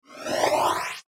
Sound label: Sci Fi Spaceship; Spaceship Door Close; Spacecraft